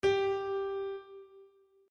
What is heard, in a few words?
pianino SOL